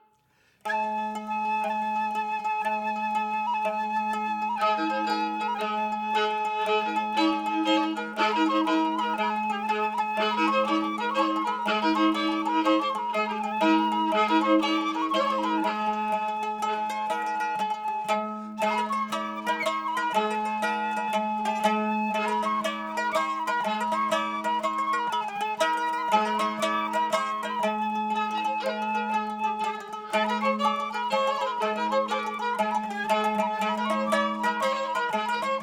Tavern; assassin s creed unity gamerip 2014

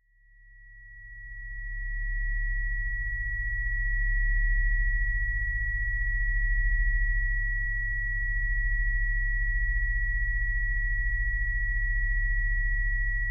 Tinnitus; Chrono Ark

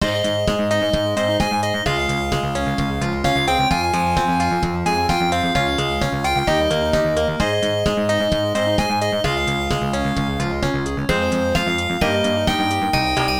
Ring Tone Melody Ver; Aneimo Second Stage; Fusion Star

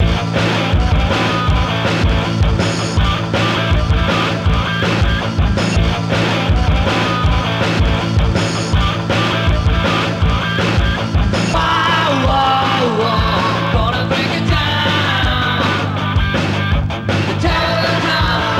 High Speed Level BGM; Twin Eagle; arcade

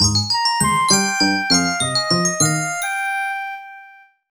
Jingle Game End Bad; Disney Fairies Tinker Bell and the Lost Treasure; Nintendo DS

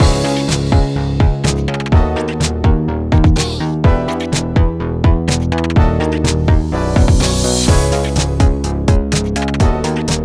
Scrambled (Instrumental); friday night funkin vs. dave and bambi omelette edition; family computer

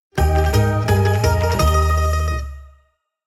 Jingle Get Item; Pokemon Masters EX

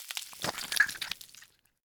dung hatchling explode

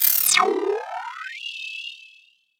Charge; Chaser

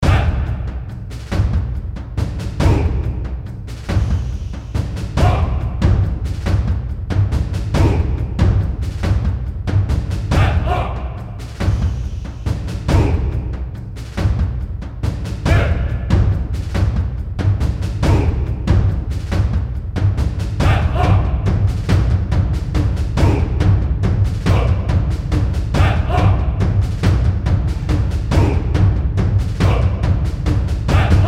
Drums Of War; Bomber Crew

